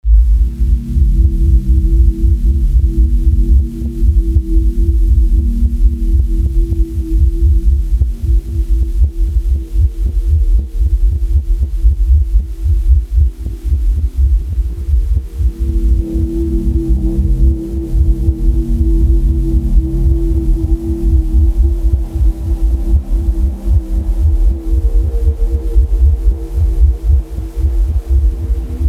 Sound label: noise dawn